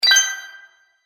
Magic Purchase Ding; Cartoon Sound Design

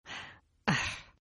Sigh Female; Exhaling; Voice

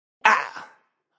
Vocal Funk Ahh 110

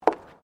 FOOTSTEPS WOMENS HIGH HEELS BOARDWALK STEP; Footstep Foley; Sound Effects:Human

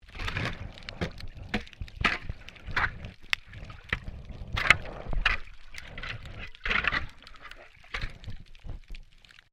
MAINTAINENCE WORK UNDERWATER SHOVEL SCRAPING

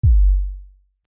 Bass Drop; Miscellaneous Musical; Blastwave

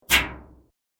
Magic Air Swipe; Magic Sound Effects; Andrew Fleming; Twistedtracks

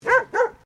Animal Dog Dalmatian Female Bark Distant; Various domestic dogs; Animals

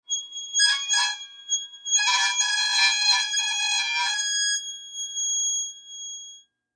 Metal Squeak; Foley; SoundEffects-Blastwave

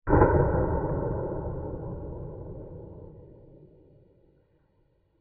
Large Dark Explosion; Explosions; Crafted Signals

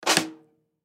Drum Snare; Snare Drum 14 Inch Throw Off Turn On Rattle; Drum Kit Sound Effects

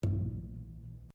Wooden Column Impact Fist; Material components for constructing more complex sounds

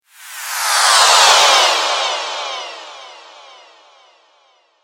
Descend, Delay, Slow, Scifi; 5.1

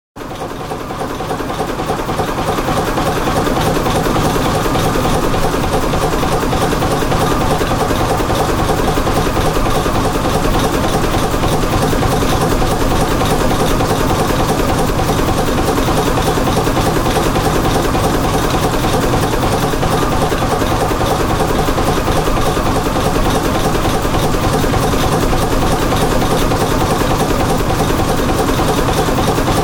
Large Boat Diesel Engine; Motors; Machines

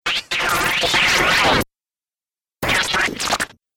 Dubstep Scifi Effects; Science Fiction Sound Effects

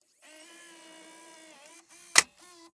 HD Camera Eject

